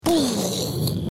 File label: bomb exp